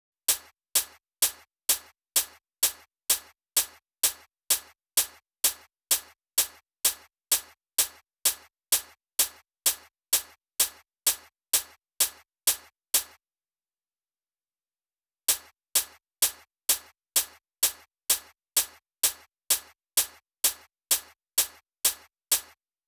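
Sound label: 128BPM Open Hi-Hat; Essential Clubsounds Vol.5; Break the Chains; Root G#min; Drums & Perc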